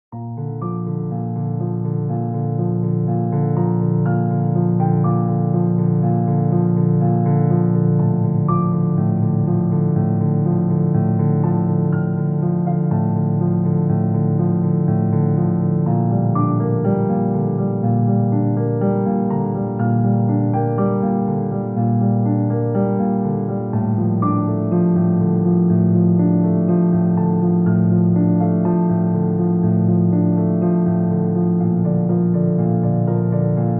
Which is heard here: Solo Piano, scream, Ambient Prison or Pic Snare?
Solo Piano